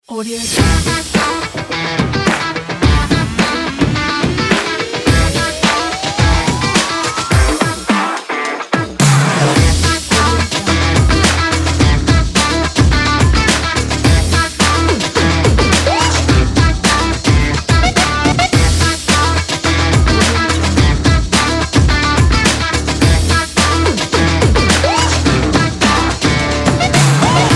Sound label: an upbeat sports adventure